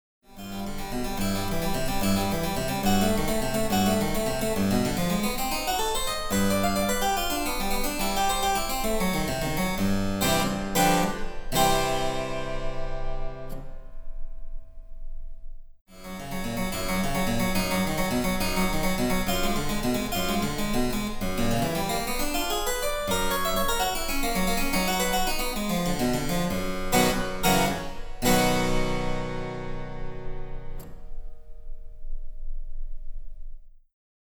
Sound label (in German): Cembalo; Mark Lindley WTC 1